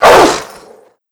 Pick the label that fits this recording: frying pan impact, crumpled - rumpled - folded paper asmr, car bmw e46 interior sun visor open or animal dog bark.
animal dog bark